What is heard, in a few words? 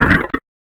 HUSK idle